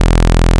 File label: wrong